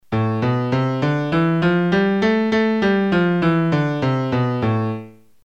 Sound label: minor scale